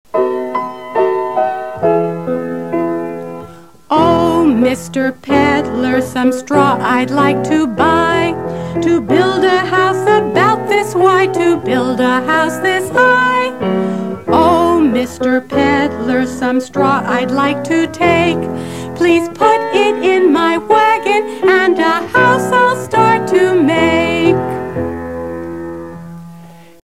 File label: Pig To Straw Peddler Song Vocal; Pig-To-Peddler Song (Straw); Three Piggy Opera